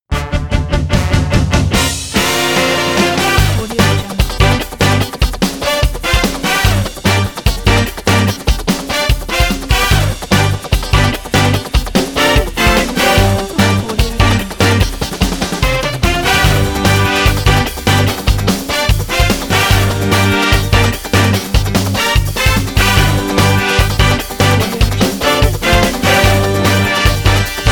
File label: fun tv show intro logo